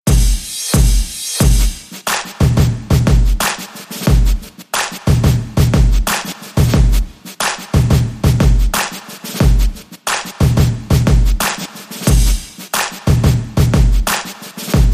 Clap Beat

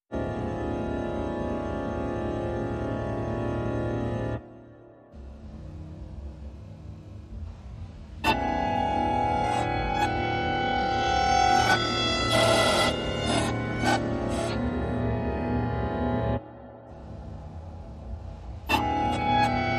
Granular Piano SFX

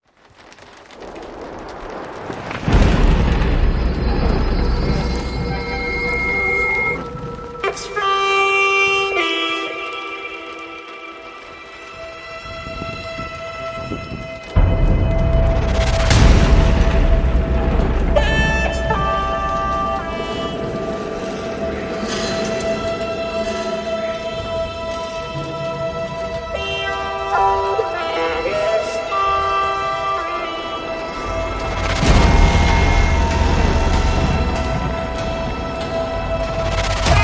Horror

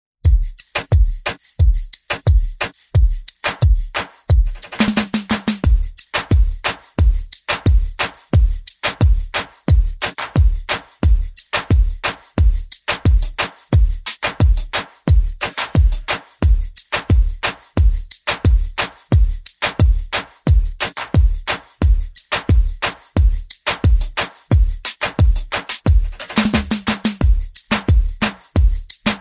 Perc and FX Only

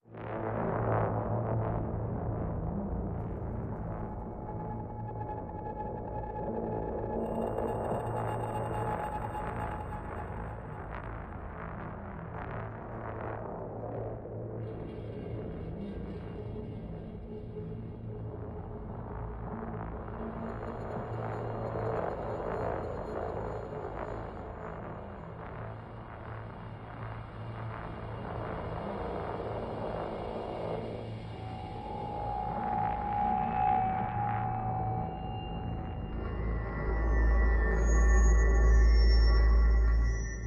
Pads Only

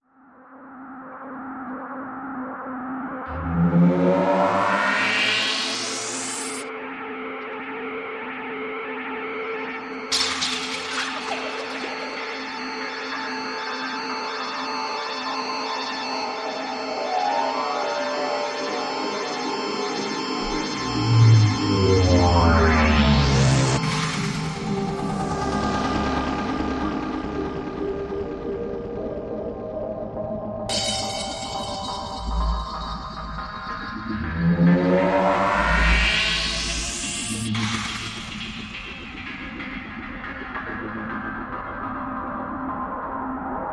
Ambient

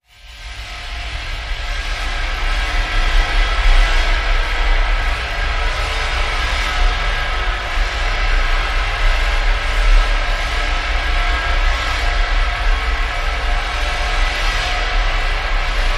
Shimmering Ocean Drone

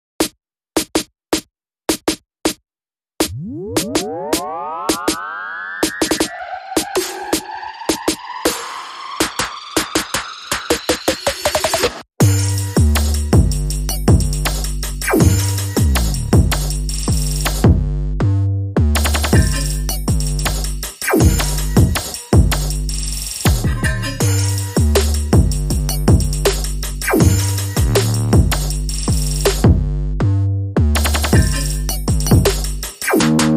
No Voices No Lead Sample

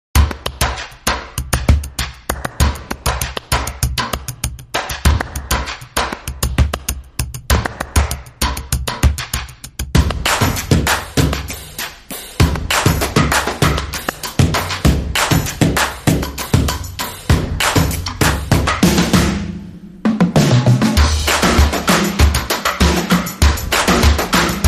Drumline

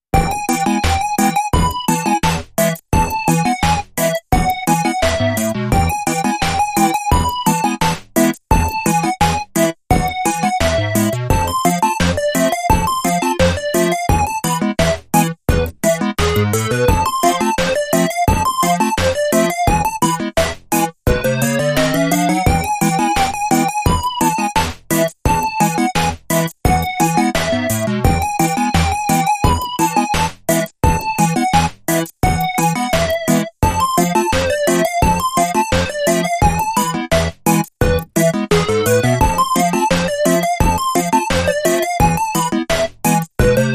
Marios Reggae

